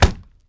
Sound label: chest hit; удар по груди; Насмешки снайпера